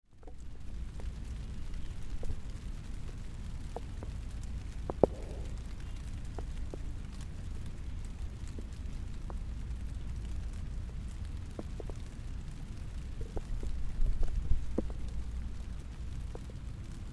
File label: soft shower